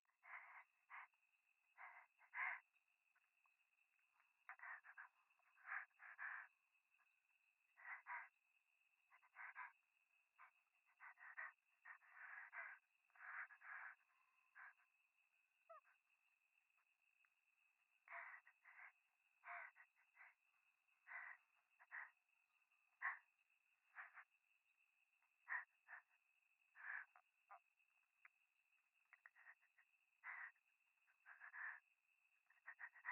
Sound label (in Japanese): 左耳快感吐息 山奥夜